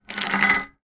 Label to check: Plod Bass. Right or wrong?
Wrong